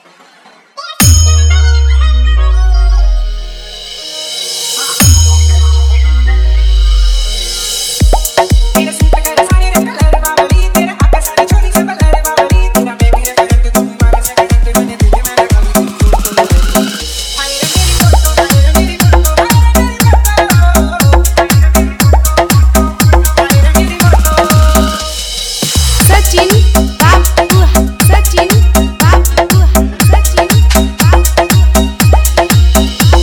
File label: Hard Gms Bass